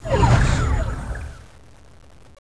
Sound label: outside redzone; cstrike; pubg